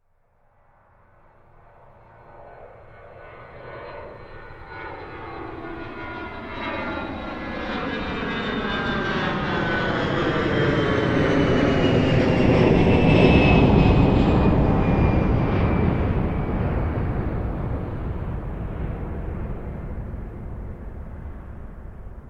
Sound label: transportation aircraft commercial jet pass low external, Aircraft (Large Jet) Fly Low Overhead; Aircraft - Large Jet Airliner Fly Overhead Very Low; Airplanes; Vehicles; McKinney Sound